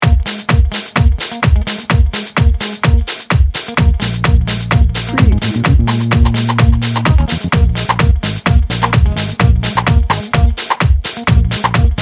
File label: funky fantastic bed